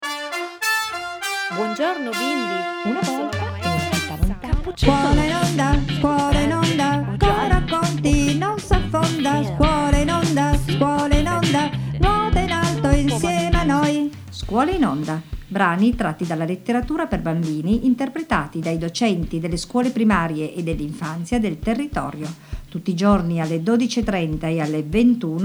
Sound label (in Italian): jingle